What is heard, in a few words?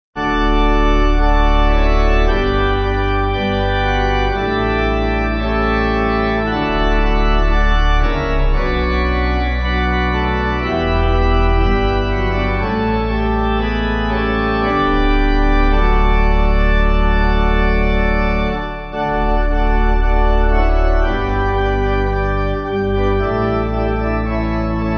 Wind Wind Blow Pipe